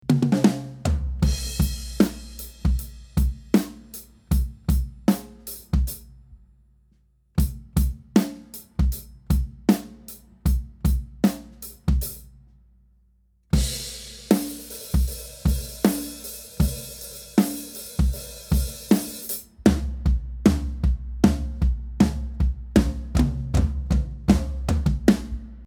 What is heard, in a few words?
Section C Snare Bottom and Toms